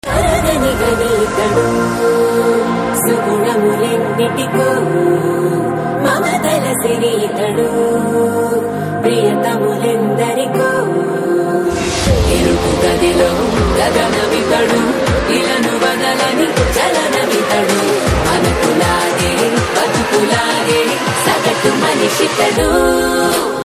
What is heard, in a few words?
Telugu Ringtones and BGM; Disco Raja